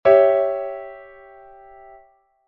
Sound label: C♯°, Chord Inversions